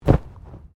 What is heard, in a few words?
Body movement Fall Heavy; Body movement foley to simulate falls and deaths; Wrestling and Struggling